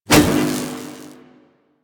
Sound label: Whoosh; Warframe; Quests; The New War; Drifter